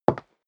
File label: step leather shoes wood parquet single right; schritte